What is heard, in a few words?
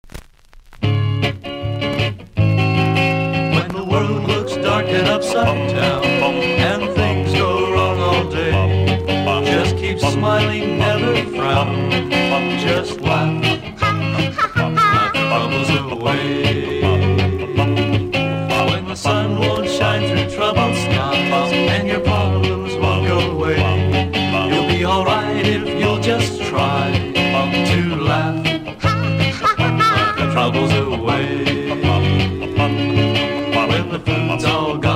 Song